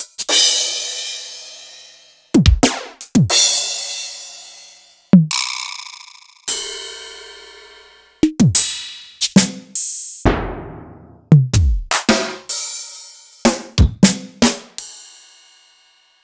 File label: Yamaha RY20, Samples Sliced; m8